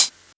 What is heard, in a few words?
smooth hi hat closed sound d sharp key; hat samples